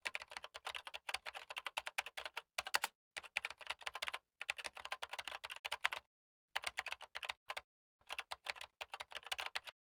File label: Keyboards Sound, ringtones; Samsung; Galaxy A SHW-M100S